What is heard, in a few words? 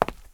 FOOTSTEP CONCRETE